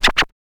scratch short, Vinyl scratches

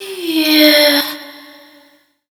FEMALE, Dance Vocals

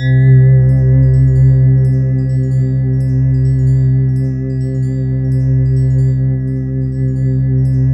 C4, Synth Line; 90 s Sample CDs; NiceBeats; SynthLine VOL-24

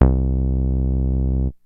MOOG BASS; Kid Nepro - Elite

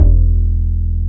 W BASS E1

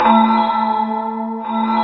AMBI PAD

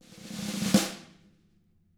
roll, snare; ILIO; Double Platinum Drums 1

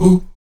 VOX AHOO, Afro Chants, Stacc Ah Oos; Roland LCDP11 Africa VOL-1

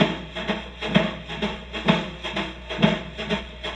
Chamberlin Rhythmate Loop, Drum Machines; Chamberlin Rhythmate Sample Pack; 300 Drum Machines

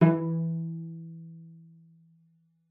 cello pizz E3; 90 s Sample CDs; Xsample VOL-5

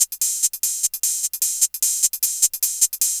Hi Hat Loop; Electronic Point Blank

